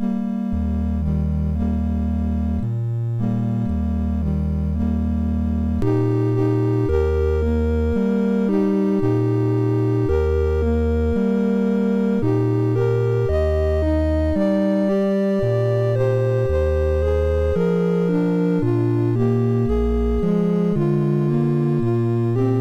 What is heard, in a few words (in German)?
Wiegenlied, MOS6581; MOS6581R3